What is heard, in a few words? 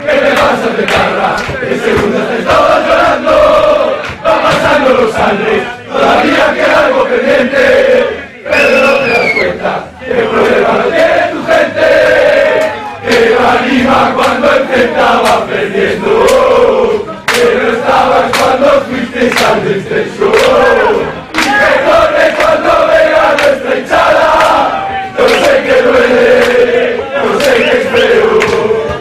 blues